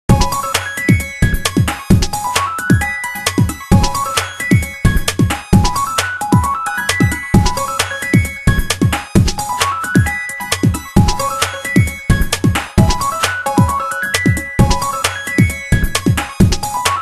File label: Ring Blink; HTC S740